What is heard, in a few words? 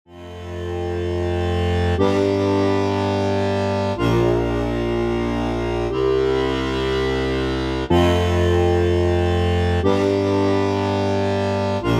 jingle